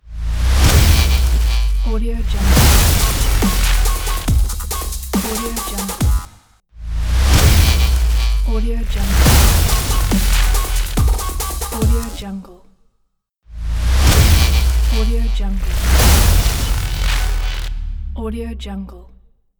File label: electric logo